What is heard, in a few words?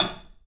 3dphat